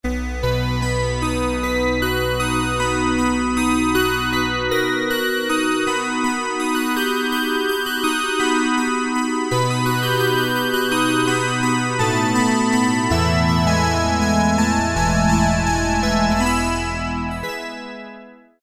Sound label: BellChime, Roland MKS-50; Factory examples